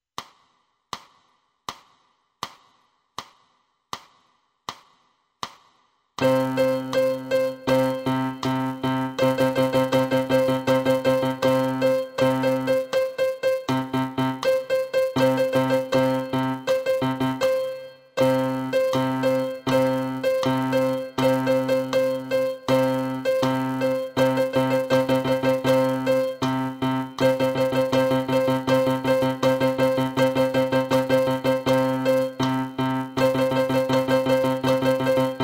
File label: piano